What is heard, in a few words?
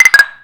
Charge